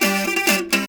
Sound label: JAKAY; Spectrasonics; Heart of Asia